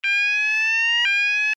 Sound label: alarm tone, sounder alarm tone; sounder beacon